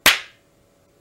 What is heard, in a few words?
slap; bfdi